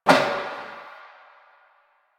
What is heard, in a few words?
Metal Garbage Hit; Warframe; Ambience; Nineteen Ninety Nine